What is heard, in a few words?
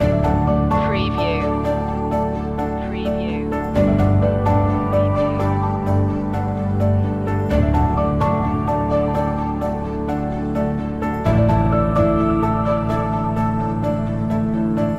how far can we go loop